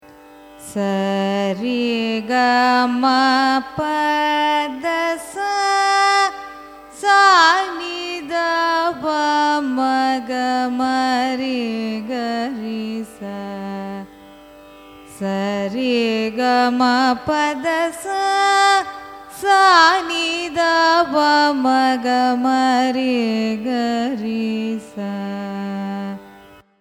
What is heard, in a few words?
raga dwijavanti arohanam avarohanam; carnatic music